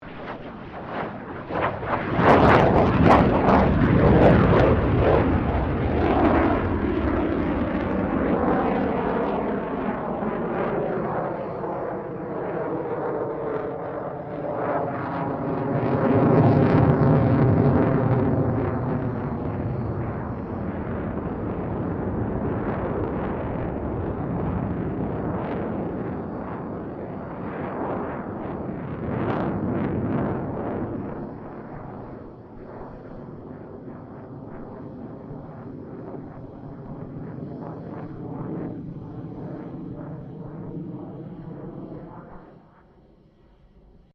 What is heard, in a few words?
AIRCRAFT F16 FALCON MANEAUVERING